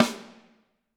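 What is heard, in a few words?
snare drum, Drums; Bob Clearmountain Drums I; SD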